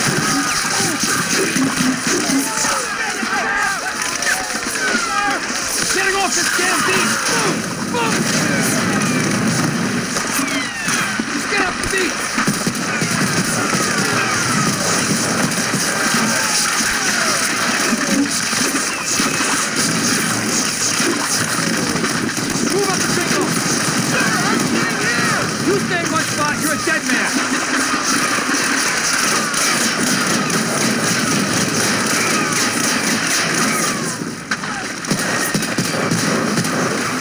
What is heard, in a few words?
war sounds